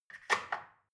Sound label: dishwasher cutlery place